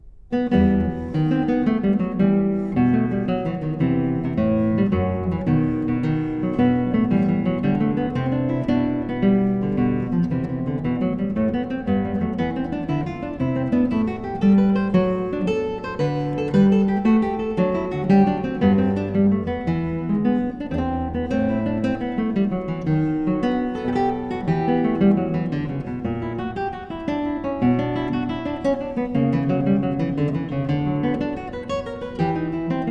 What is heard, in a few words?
Partita for solo violin in D minor Courante; BWV 1004; Bach; The Six Sonatas and Partitas